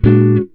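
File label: guitar chord; Univers Sons; Jazzistic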